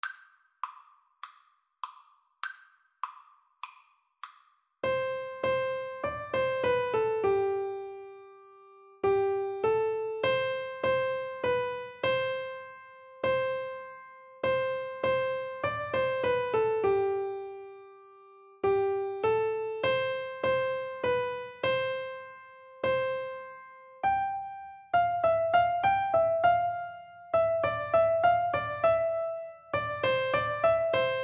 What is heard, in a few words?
ding dong, Piano Duet